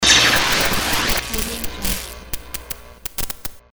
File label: electric spark